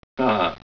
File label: monk laugh, monkey